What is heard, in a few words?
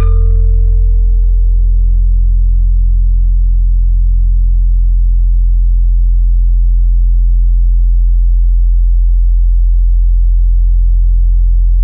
Real World Mibira; Instruments; Synthetic's Serum Collection; Echo Sound Works Core Tables